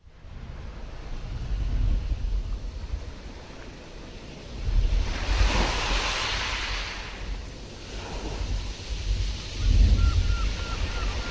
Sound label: Sound Therapy Sea Storm, ocean sea sounds